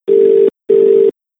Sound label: Aussie Muted 2 rings normal